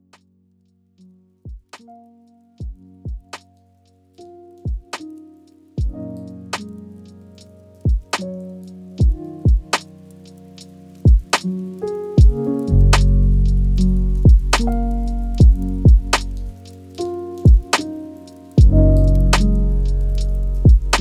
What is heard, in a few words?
lofi hip hop